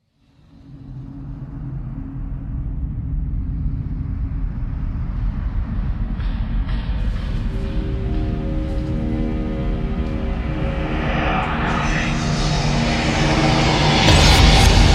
horror trailer music creepy atmospheric horror music